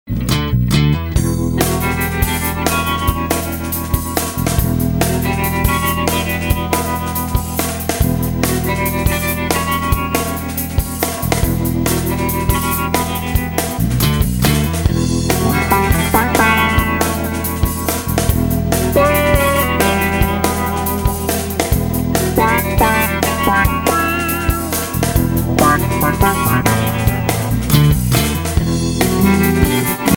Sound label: 3 Notes